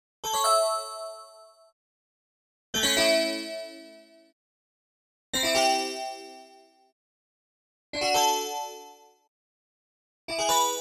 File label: free Spin Sound; basketball Star Deluxe